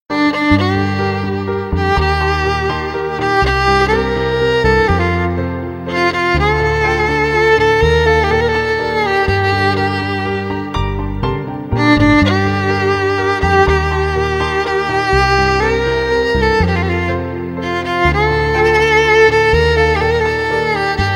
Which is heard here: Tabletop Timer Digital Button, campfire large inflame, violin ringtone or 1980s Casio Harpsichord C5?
violin ringtone